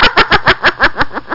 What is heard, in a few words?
LAUGHTER; white rabbit demo